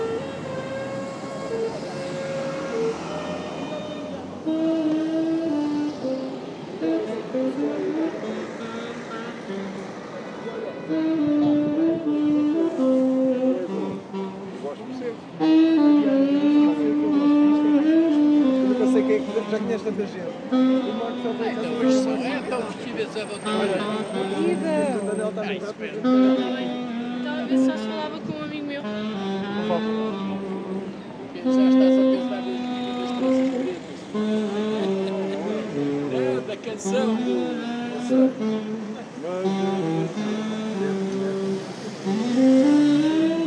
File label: lisbon sax